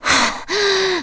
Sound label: Breathe; Mila breathing (while stealing); zelda sounds